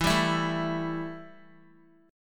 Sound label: E Minor Double Flat 5th E Guitar; strummed; JGuitar